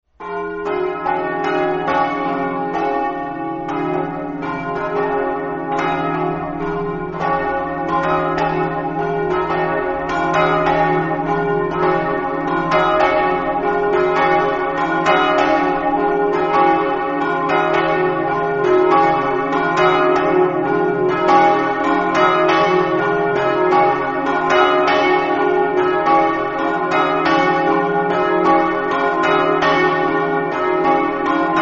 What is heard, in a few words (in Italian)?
campane, Mossa